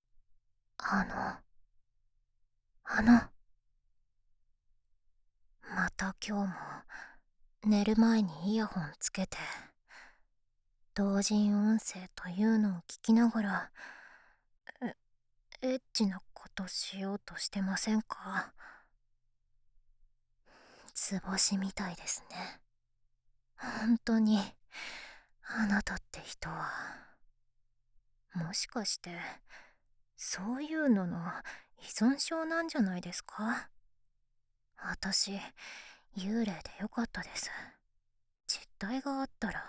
唾液越しに耳舐め; 体液越しの幽霊ちゃん

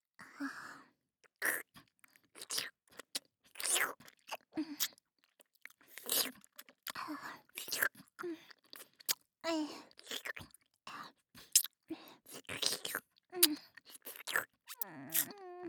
フェラチオ連続射精; 本当に初いヤツよのぉ…; のじゃロリ狐少女のまったり筆おろしで童貞卒業しました【OPM SHORT】

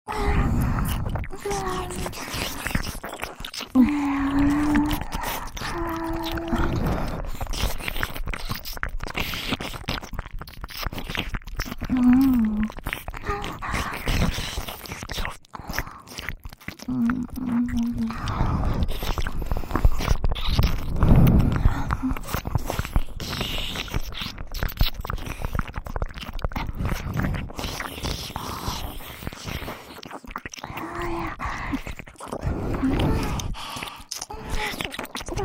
両耳舐め; ソフィ＆エミィ

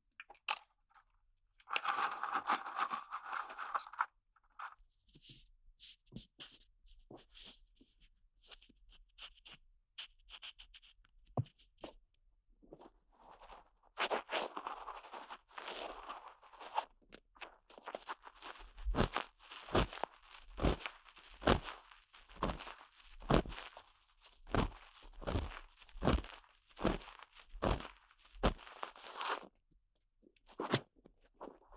綿棒耳かき, 音だけたっぷり(声なし); 【安眠的17小时ASMR】为现在就想睡觉的你哄睡的治愈少女。让大脑放空的全套安眠(现实掏耳・按摩・耳语)

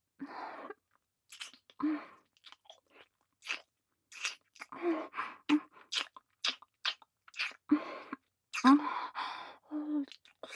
ディープキス

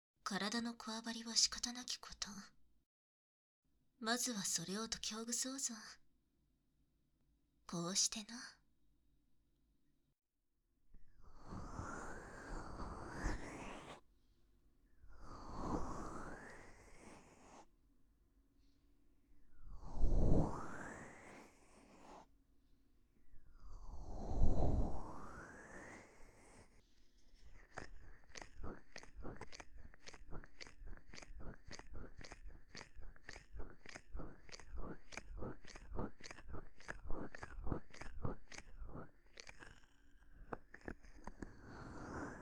ループ用音源, ASMR, 掏耳

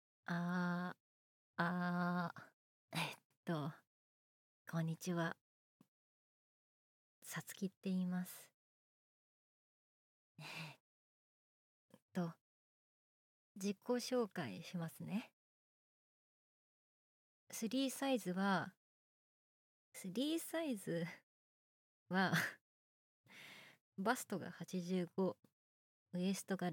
ボーイッシュ な 新人声優 が 初体験 オナニー 実演; 初めての電マ&ローションで4回ガチイキ～THE FIRST BOYISH

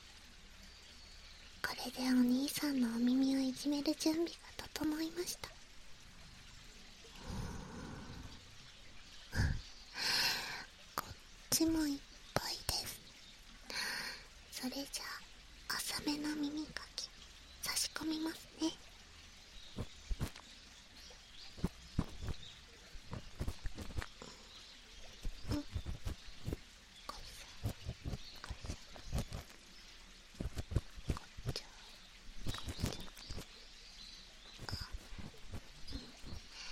【左】浅め耳かき, ASMR; エルフメスガキ; 森の癒し耳ほじり

